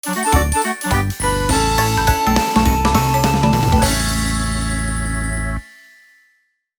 Opening Jingle; Woody Woods

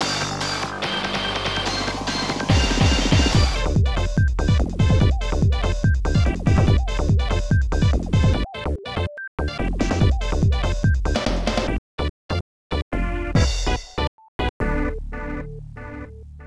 Ending; From TV Animation Slam Dunk Super Slams; arcade; Ryonan

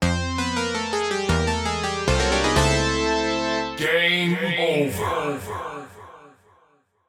Game Over!

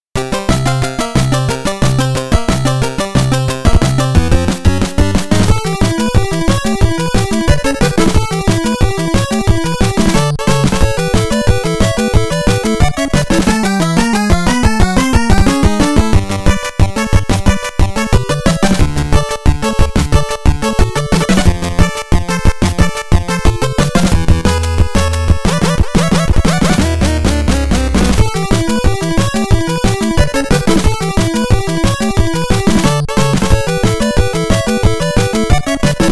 Battle Mode; konami famicom chronicle vol.3 rom cassette edition

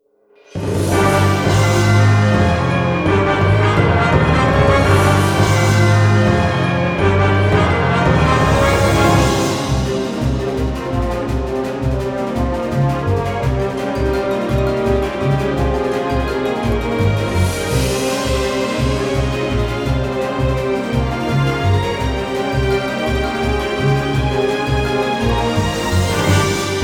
Victory or Defeat; berwick saga lazberia chronicle chapter 174 soundtrack

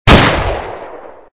snipe; Gun Mayhem 2

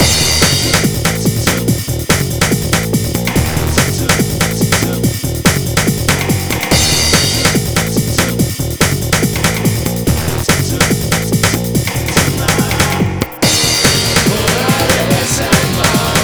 Battle Loop; Asterix Obelix XXL 2

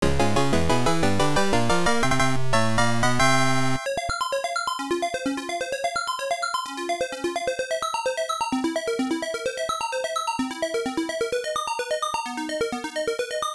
Start Demo Main BGM; Exed Exes; arcade

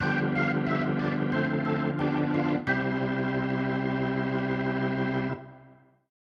organ

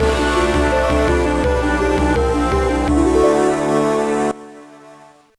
Unused Jingle; Legend of Spyro The Eternal Night 2007 gba

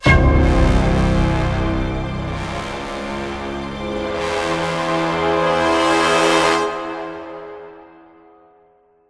Miniboss Near Stinger

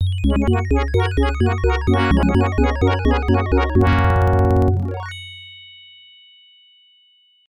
Fanfare, AdLib; Apogee; Blake Stone Planet Strike; MS-DOS

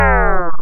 Power Down

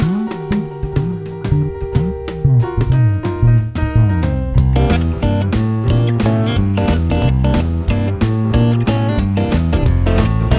Robot Dance

